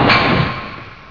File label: door, doors; ParoxysmII